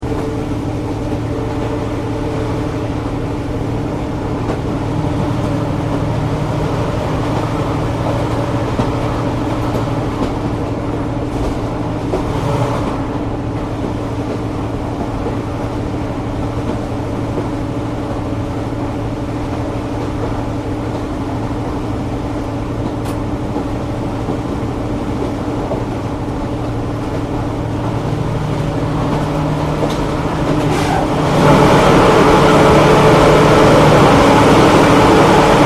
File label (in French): en ligne entree tunnel